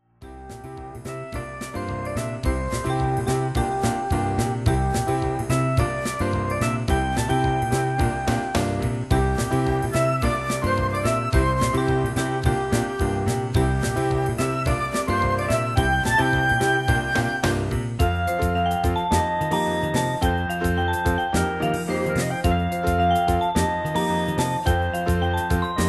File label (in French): Entrainant